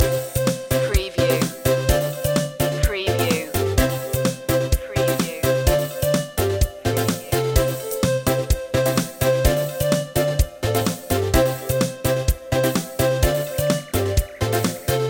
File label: playtime loop